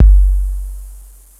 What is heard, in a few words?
808out